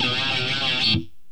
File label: guitar, heavy distortion guitar